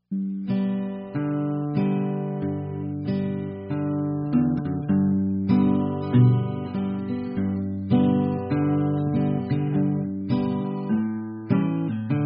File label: guitar